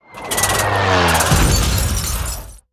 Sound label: Gyrocopter Death